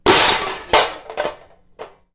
Metal Debris Impact Settle

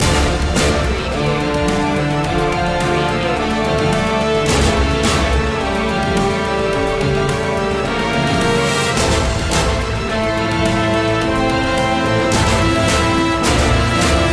battle in 8-bit space

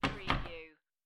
Playing Cards Tap Deck Of Cards On Wood Table

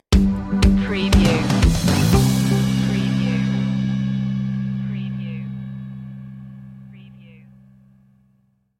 undiscovered light stinger

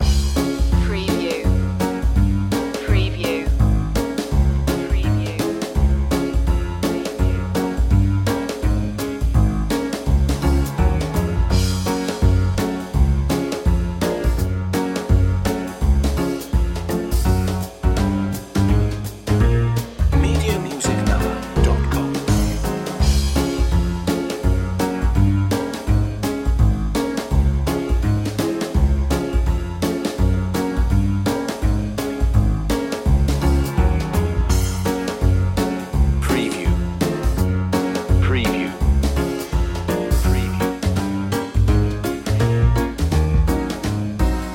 ragtime promenade underscore

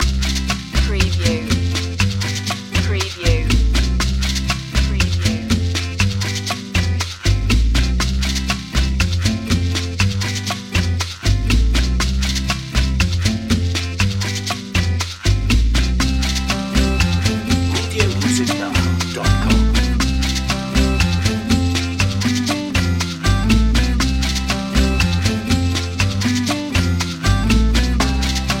guajiro groove

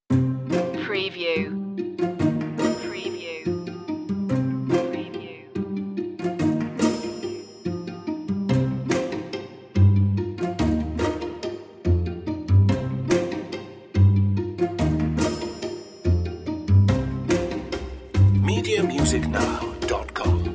curious machine underscore

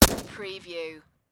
Machine Gun Sig SG5

